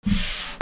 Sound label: FRICTION